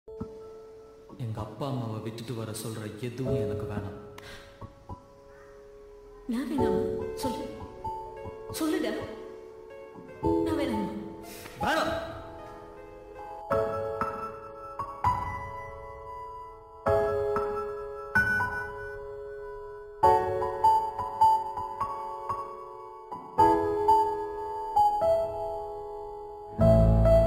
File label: sad bgm; aditya varma